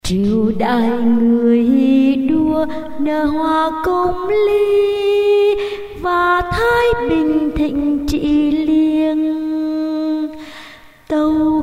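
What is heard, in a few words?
soprano tenor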